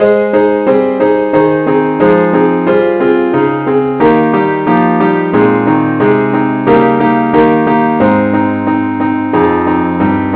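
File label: keyboard only, key a flat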